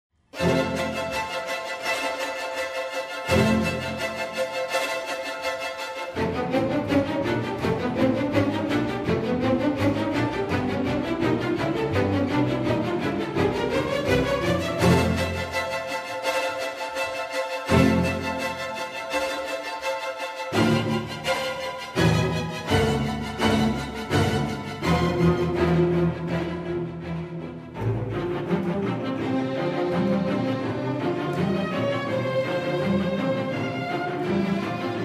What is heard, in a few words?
Concerto for Chamber Orchestra; Raats; I. Allegro